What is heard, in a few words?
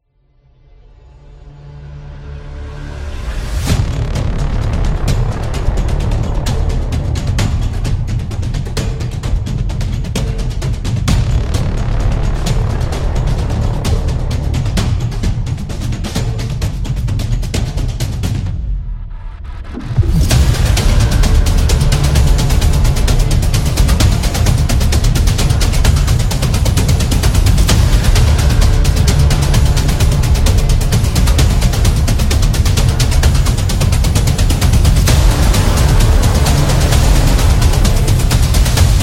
Suspense Action, war drums